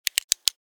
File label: Tactical light button click